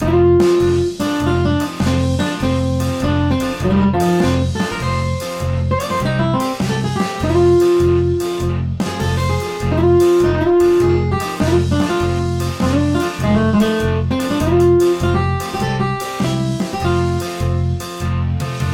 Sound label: Blues